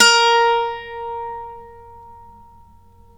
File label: a3, Mandolin; Terkelsen